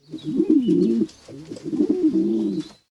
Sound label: bird dodo